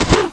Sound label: jump; Ferazel's Wand Demo